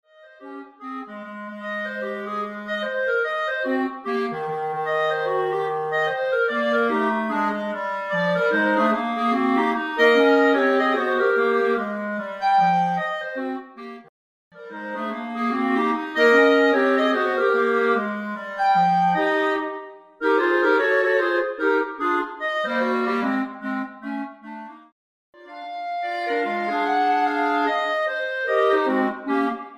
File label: Stumblin Blues